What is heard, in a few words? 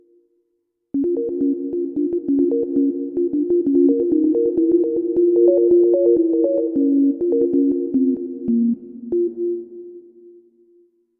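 Sine Lead, digital piano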